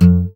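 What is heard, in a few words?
GUI Ac Dmp F, acoustic guitar; Best Service Gigapack 2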